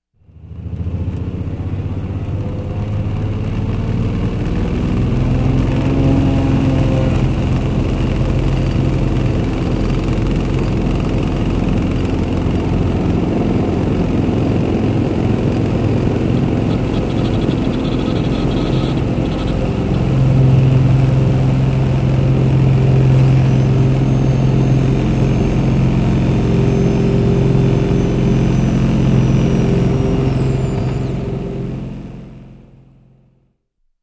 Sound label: Propeller aircraft cockpit after takeoff retracting flaps